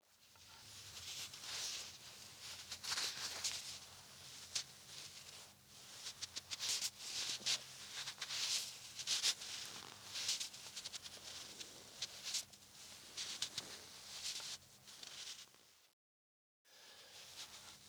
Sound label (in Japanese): 服の上から胸を触る音